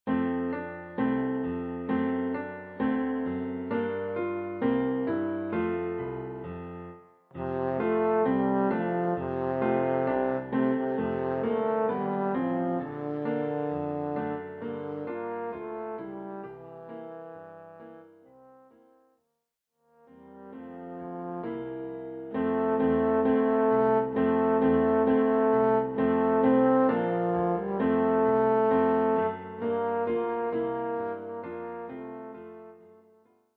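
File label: trombone, für Posaune und Klavier; Jingle Bells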